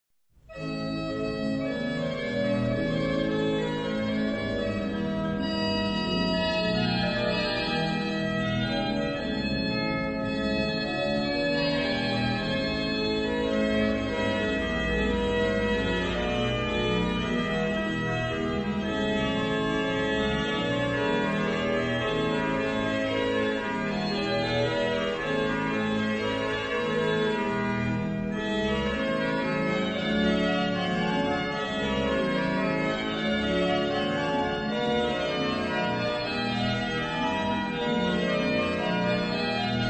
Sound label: Trio, orgue; Balbastre